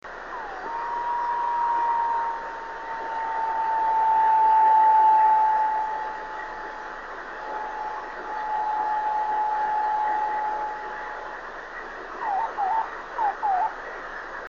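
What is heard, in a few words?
drift net beacons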